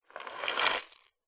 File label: household jewelry in wooden box tip slide lid open; zapsplat